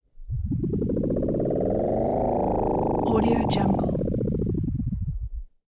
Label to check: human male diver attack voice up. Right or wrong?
Wrong